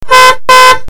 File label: car horn